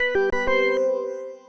startup

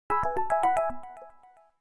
A toy watch; Samsung Galaxy S Duos CDMA SCH-i919; alarms

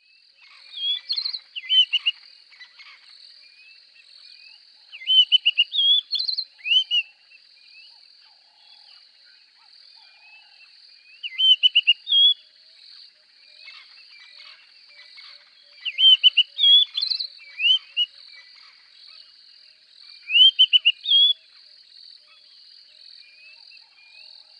Zimbabwe savannah at dawn; Google; Google Sounds; Sound Matters; alarms

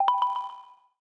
notifier, notifications; Mozilla Boot2Gecko; pocko

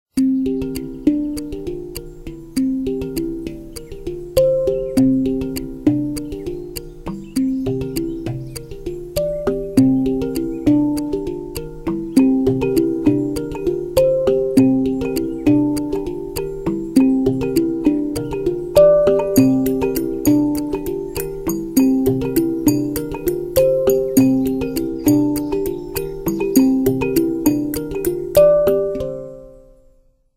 Clock (Hang Drum); Windows Phone apps

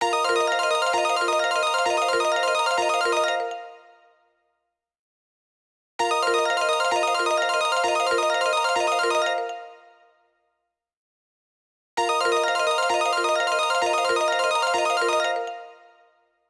Simple Beep

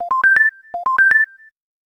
Calm Calling, notifications; Fujitsu Arrows-F-04H

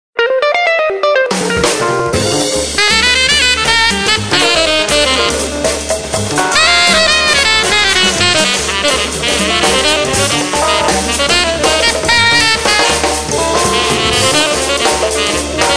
Jazz Bar

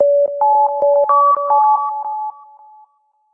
signature, Sony Xperia Z2 notifications

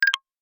Sound Recorder Stop; Alcatel Pixi 3

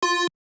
dial tone

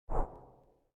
Homescreen Remove, LG Stylo 4 Plus ui

